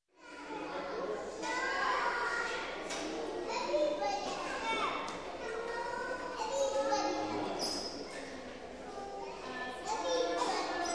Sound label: Children Playing In Gymnasium, Ambience; Sound Ideas 2000 Series